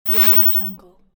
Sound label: fast electronic swoosh